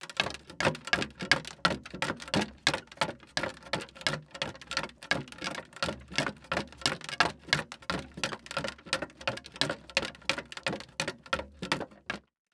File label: Foley Footstep Hard Sole Run On Boardwalk Wood; Foley Footsteps